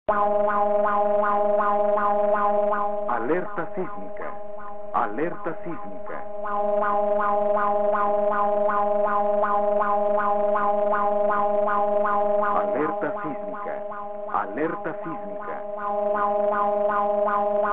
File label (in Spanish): alerta sismica cdmx